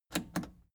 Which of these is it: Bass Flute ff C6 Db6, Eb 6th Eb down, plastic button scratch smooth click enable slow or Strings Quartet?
plastic button scratch smooth click enable slow